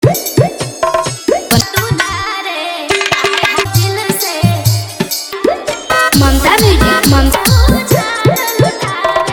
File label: Bhojpuri Dj Remix Song